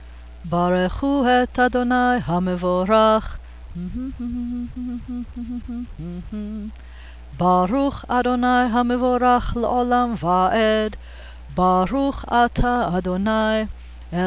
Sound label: Torah Blessing before, sung